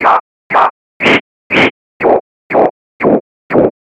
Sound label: Noise Formant K